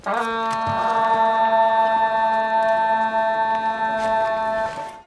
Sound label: eldar horn